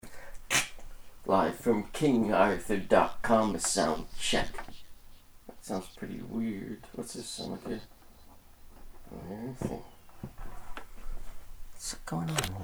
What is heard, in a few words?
soundcheck